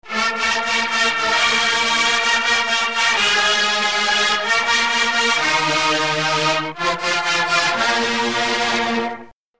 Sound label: fanfare